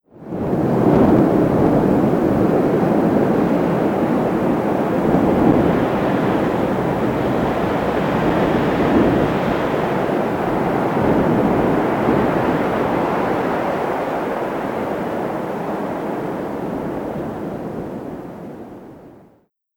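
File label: Cities Las Vegas Wind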